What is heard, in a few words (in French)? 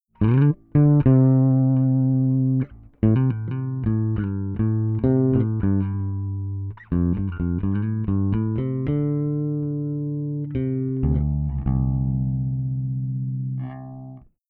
reglage plat gain 0 fond doigts, Bass; smpro; pr8; oc8; tb101; sm-pro-audio; PR8-MKII